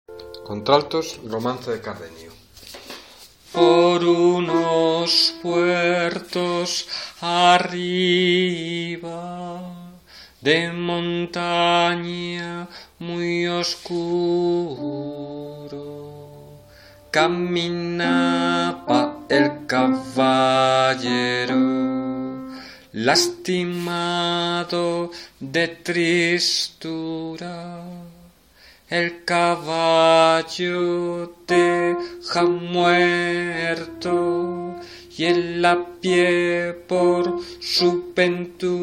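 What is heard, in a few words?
alto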